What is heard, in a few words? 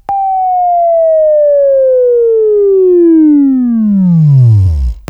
Buzz, Left